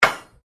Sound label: Blacksmith Hammer Strike